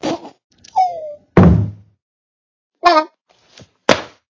Football head poke fall

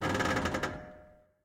Creak Metal Medium

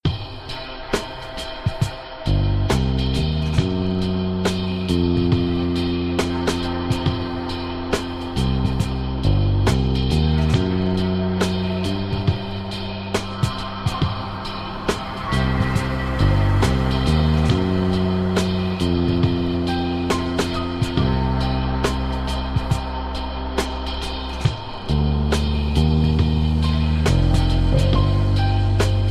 Ambient City Music

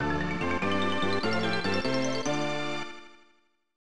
Level completed; Lethal Weapon; SNES

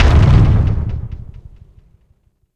Totem clear Thud; super mombo quest

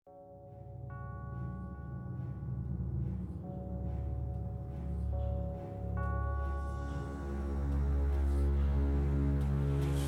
Main Menu Music; Belief & Betrayal

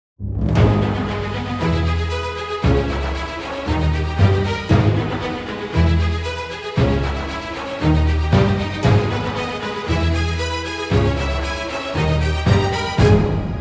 Fight; Father Wolf Fight

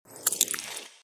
Boss Freeze; Enough Plumbers 2

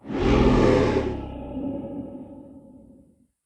Large Creature; hero in the ocean 2

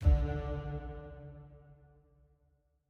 Desert SFX, Stinger Neutral D

